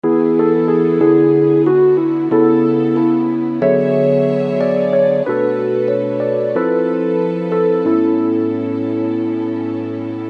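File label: Electric piano plus strings voices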